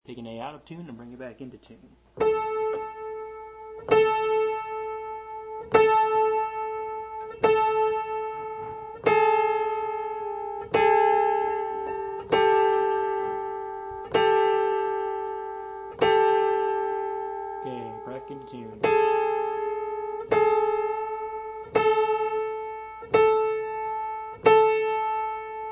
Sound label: piano tuning